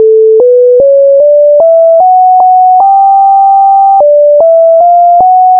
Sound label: One Octave Higher